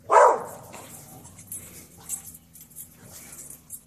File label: Riding Dogs; SyrinScape; City gone mad